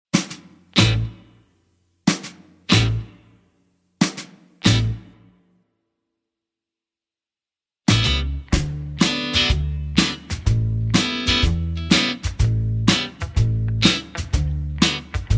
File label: D No Backing Vocals, backing tracks